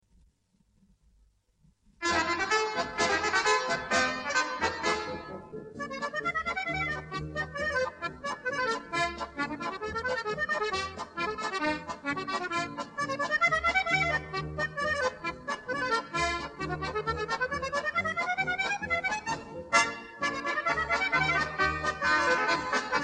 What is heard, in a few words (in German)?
Lustige Polka, Accordion